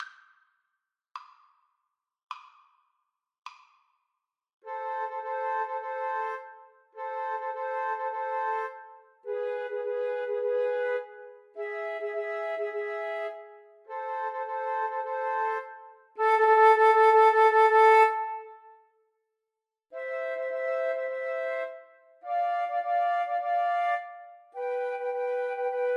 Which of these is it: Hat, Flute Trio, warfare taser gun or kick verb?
Flute Trio